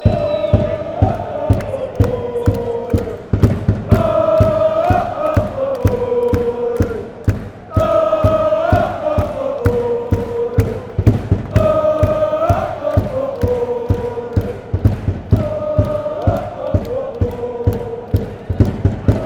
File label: aalesunds chant